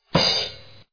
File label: Cymbal; TIPro